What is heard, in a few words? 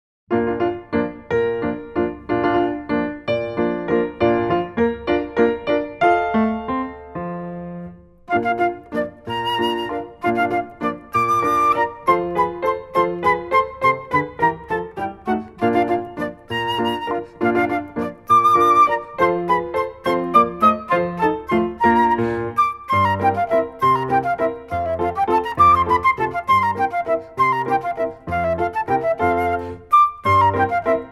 flute